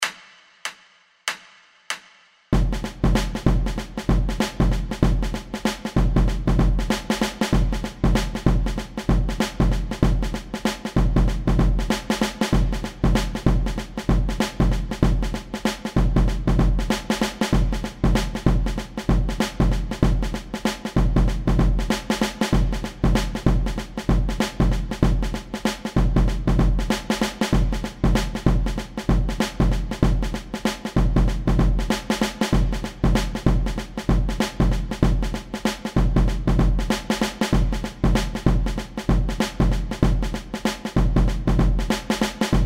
Batidao Caixa + Surdo, samba

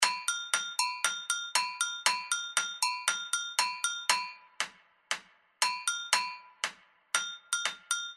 Passinho Agogo; Carnaval; Carnaval2105

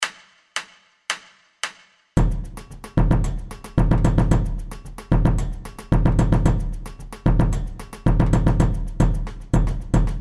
Levada Dobrado, samba

